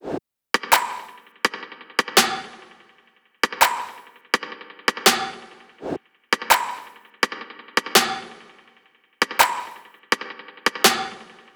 top loop 83; Pop; Drums; Loops; Slate; SD PASADENA Pop Sample Pack

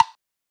perc wood, Drum One Shots; SD MIDNIGHT Sample Pack; Slate

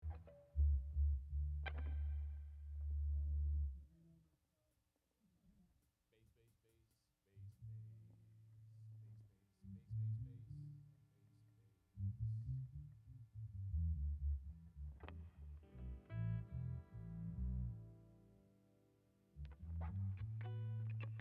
Vocal Emphasis Mix

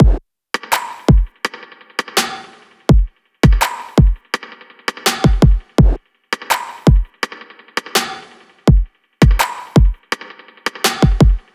drum loop 83; Pop; Drums; Full Drum Loops; banbury; Slate; SD PASADENA Pop Sample Pack

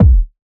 kick gut, Hip-Hop; Drum One Shots; SD CAICOS Hip-Hop Sample Pack; Slate

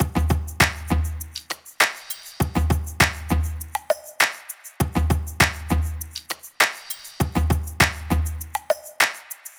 top loop, Drums, Loops, Top Loops, Pop; Slate; SD PASADENA Pop Sample Pack

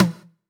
Tom Halted, 909 Toms; Slate; Vintage Drum Machines Volume 3 909 Edition

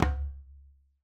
djembe Single Hit Flams; Raw Perc One Shots; Slate; SD SEISMIC Drum Sample Pack

